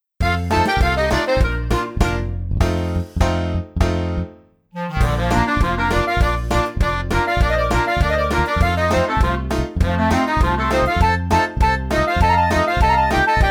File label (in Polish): POLKA DZIADEK INSTR, polka